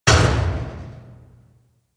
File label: Mech Step Metal Accent; Nechro